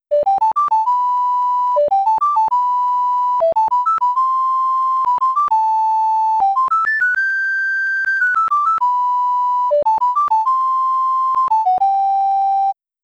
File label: ocarina